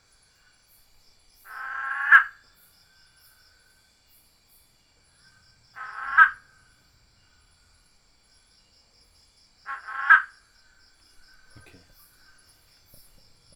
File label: Call Rentapia hosii